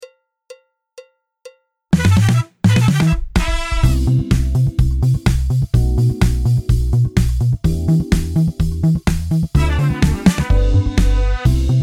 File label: karaoke Dance in home mix